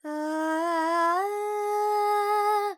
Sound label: Phrygian, Vocals, Carnatic Female, Alaps, Dry; 70 G; Sample Banks